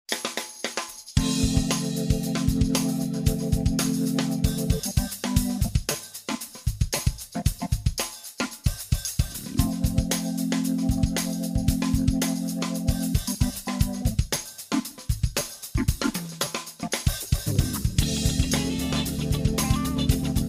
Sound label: Backgrnd; Rosegarden